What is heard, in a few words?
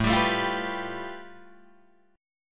A 7th Suspended 2nd Sharp 5th A, Guitar Drop D; chord